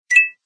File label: button